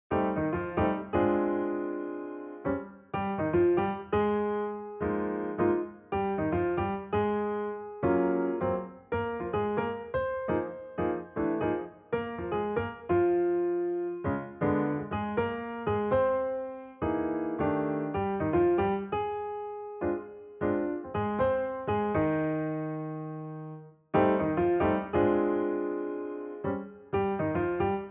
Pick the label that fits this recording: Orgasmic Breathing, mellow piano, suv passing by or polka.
mellow piano